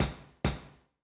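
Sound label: Beat - Closed Hats, Grime, 135bpm; Zero-G Sound Sense Grime Scene; Construction Kits; A Positive Vibe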